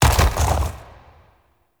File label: Unyielding Gallop Hooves Land; Warframe; Dagath